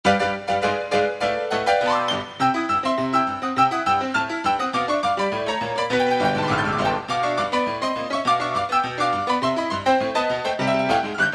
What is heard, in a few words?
Keystone Piano